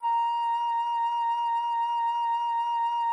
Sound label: flute Bb5